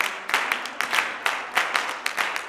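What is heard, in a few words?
CLAPS 96, Gospel; Blues & Soul; G.CLAPS 96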